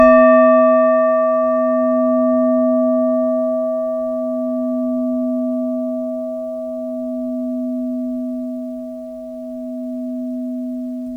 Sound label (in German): Klangschale Zen H, gegossen; Klangproben